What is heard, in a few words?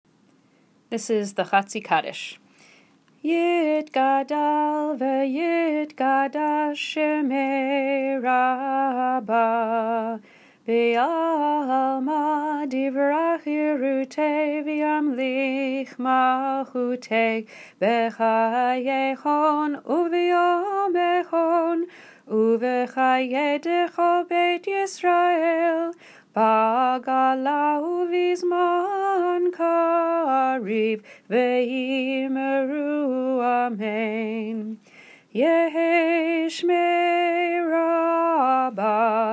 Chatzi Kaddish